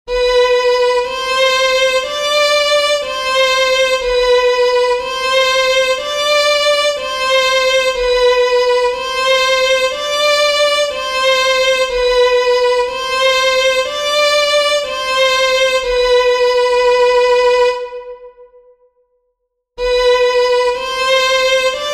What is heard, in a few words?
Fifth Position Exercise 60bpm, mandolin